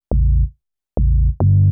TECHNO BASS 140 A

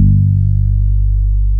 BASS DX

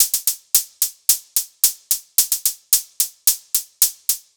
110 Closed Hat Riddem; Loops; Percussion; Touch Loops; CosmicFunk

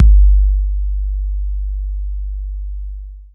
BD SO LOW, bass drum; BASSDRUMS

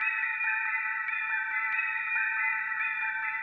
SPACE SYNTH 140 A; SpaceSounds

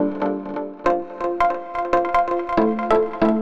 TECHNO EXTRA 140

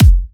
Monster Kick, Drums, Kicks

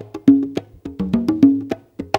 CONGA B2 105, PERCUS LOOPS

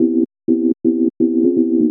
house synth 125 A, keys

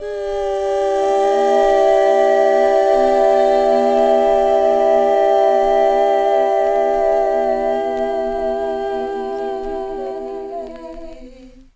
drip vocal

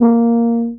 TUBA STRA A#2, Tuba Straight; Orchestral; DirectWave; Varazdin Orchestral

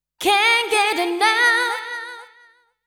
deep house female, 125, A, vocals

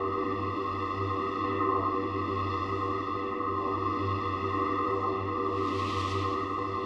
SPACE PAD 140 A; SpaceSounds

